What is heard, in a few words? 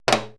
80S DRUM SET